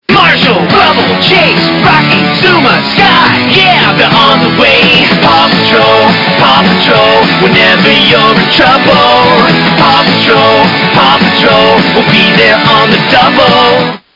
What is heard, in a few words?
SATB